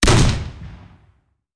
Hand Gun; Sound Ideas; Guns

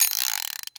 Alarm Clock Wind; Clocks, Watches and Timers; Blastwave

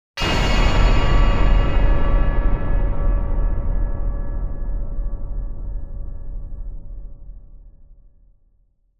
Impact Metal Strong Hit - Lowest; Hollywood Edge; sound designer's toolkit v2

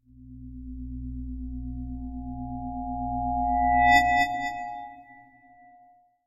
Feedback Intrusive Tone - Slower Motion; Hollywood Edge; sound designer's toolkit v2

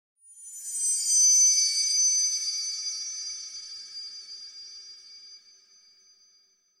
Metallic High By Slow Danger; Hollywood Edge; sound designer's toolkit v2

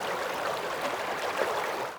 Water Canal

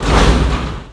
Door Large Metal Stop; SFX Kit; Doors

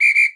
Hockey Referee Whistle; Hockey Horns & Buzzers

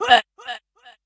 Bandit Gunner Death; Kilta

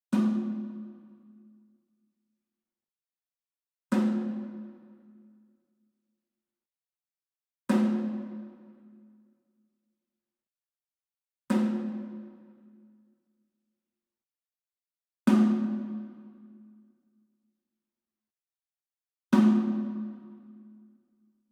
SMALL DRUM Tambora Hit Hard Beater Far; boom library; Cinematic Strikes Construction Kit

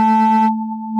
Danger Alarm; Space Wave Race

